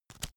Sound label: paper browse